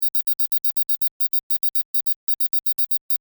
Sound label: Science Fiction Teletype Readout Beeps; Communications